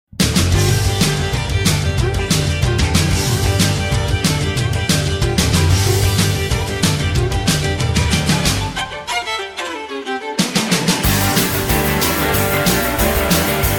(G) No Backing Vocals

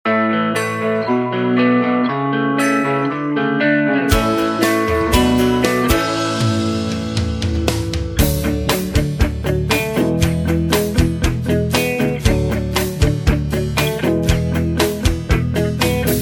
(A To B) No Backing Vocals

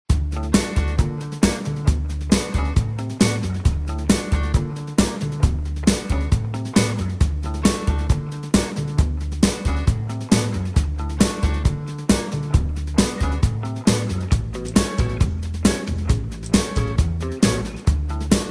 (D) No Backing Vocals

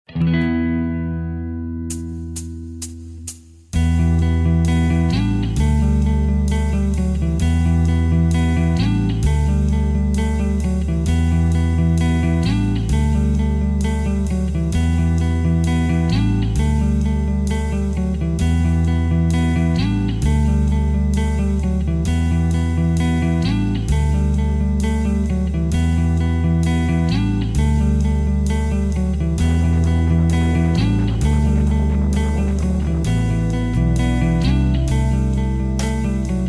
(Em) No Backing Vocals

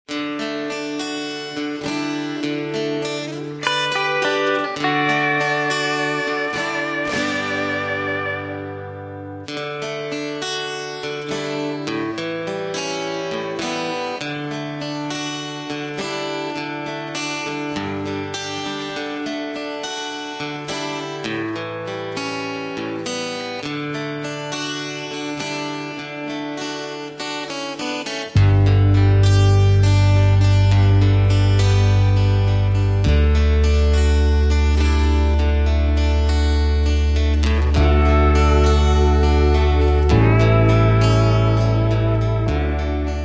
D No Backing Vocals, backing tracks

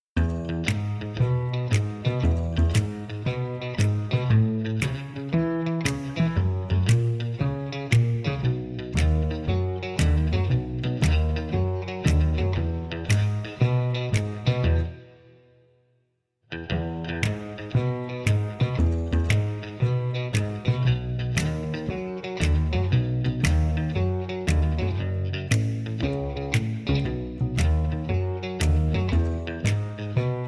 (E) No Backing Vocals

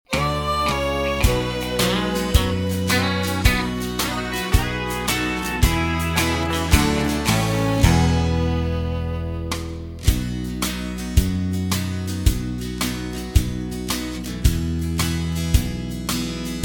E No Backing Vocals, backing tracks

